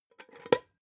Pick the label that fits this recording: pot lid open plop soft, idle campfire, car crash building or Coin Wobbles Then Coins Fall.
pot lid open plop soft